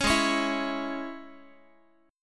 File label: G sharp Suspended 4th Sharp 5th G sharp, Guitar; strummed